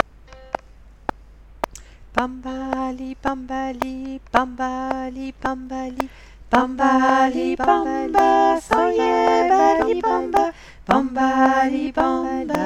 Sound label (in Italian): Tutti